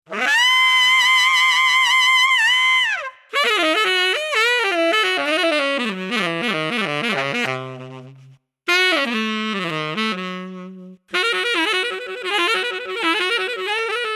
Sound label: Tenor Sax Riffs